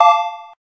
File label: electric zero, keytone; SKY IM-A710K-VEGA-Xpress